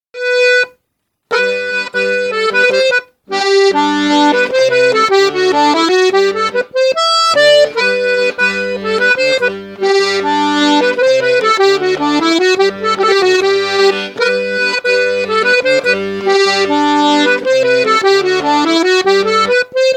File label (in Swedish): Schottis melodi; efter Johanna